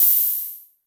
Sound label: vermona drm1 mk3 hh